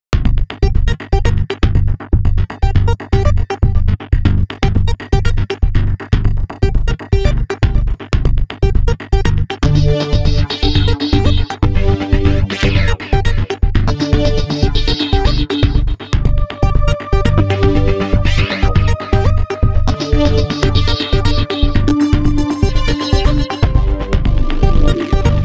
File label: Electronic, EDM